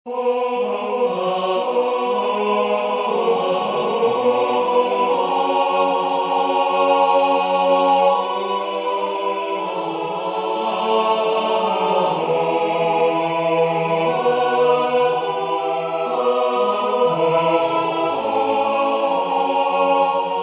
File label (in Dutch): Tutti, koor; H.Schutz; Nonne